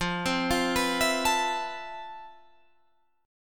F Major Sharp 11th, Guitar, Drop D

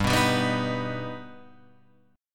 G Augmented G Guitar Open F; G+ strummed; 7,6,7,6,6,6; JGuitar

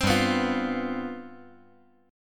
G 11th G, chord

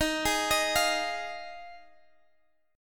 F Minor 7th D sharp

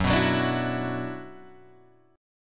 Gb 7th Suspended 4th Sharp 5th, Guitar Open G; chordshape; down

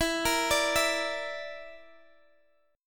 A sharp Major Flat 5th, E

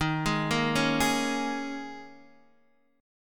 D sharp 7th Suspended 4th, Guitar, Open D

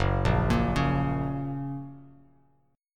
Gb Major, Bass Standard; Gb arpeggiated; JGuitar

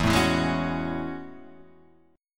A Major 13th, guitar

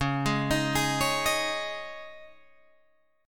C sharp Suspended 2nd, Guitar, Open G; 11,13,13,13,14,13

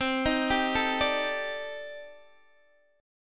C Minor 6th Add 9th C Guitar DADGAD

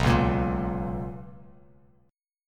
B Major 7th Suspended 2nd Bass Standard, chord; BM7sus2; strummed; JGuitar

